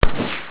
rifle

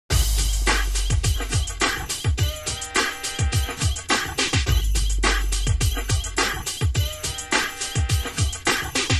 Loop GroovE

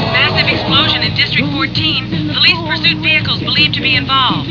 explosion

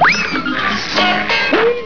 toon noise

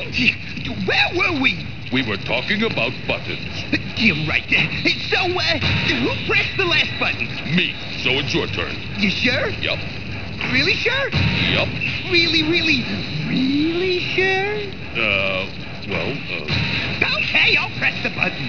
hack slash button

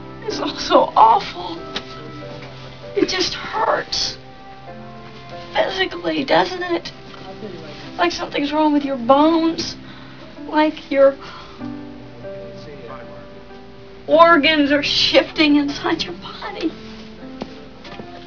Organs Shifting

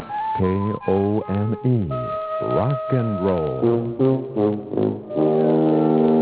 jingle

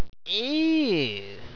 eeeewwwwwww

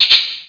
Warning

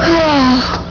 sigh

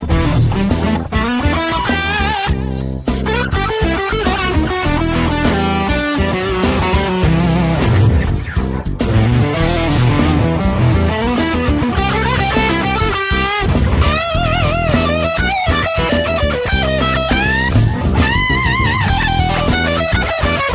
fusion

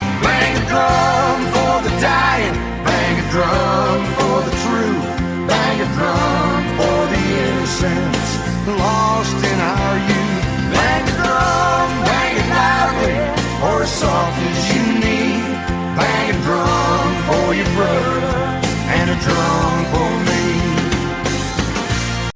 bang a drum

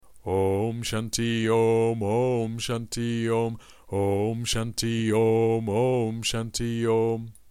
Om Shanti Om, Sanskrit Mantras

